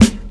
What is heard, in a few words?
00s sharp acoustic snare sound g sharp key